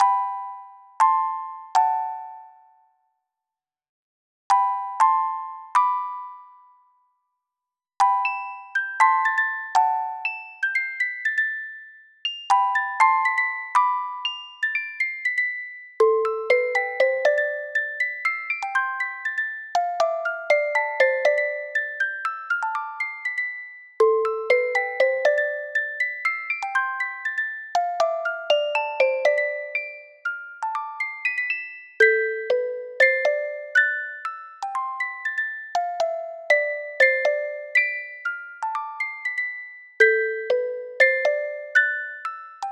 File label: Technopop (Music Box)